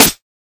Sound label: perfect snare drum sample e key